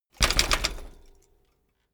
bicycle bike land rattle full

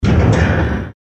big metallic noise

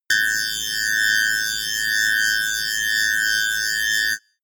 sci fi alarm red flash long

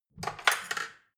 dishwasher cutlery grab

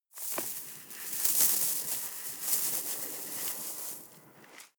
snow trickle medium

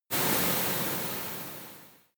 volcano oneshot lava hiss

